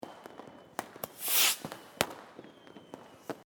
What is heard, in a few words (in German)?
Silvester Zischer